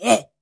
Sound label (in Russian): strannii muzhskoi skrik korotkii aktivnii ha